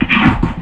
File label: MECHANICAL; Rarefaction; Poke in The Ear With A Sharp Stick